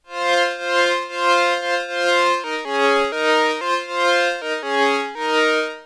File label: accordion; OSCar